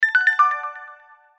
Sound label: sound New Round Open